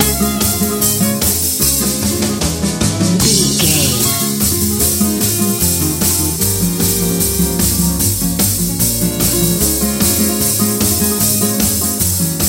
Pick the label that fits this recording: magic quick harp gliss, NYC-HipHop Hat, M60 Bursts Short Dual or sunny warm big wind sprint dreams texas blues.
sunny warm big wind sprint dreams texas blues